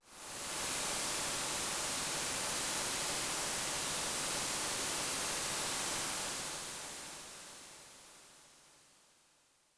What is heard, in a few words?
Rain and Flowing Water; Chrono Trigger